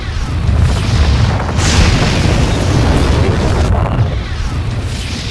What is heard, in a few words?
quake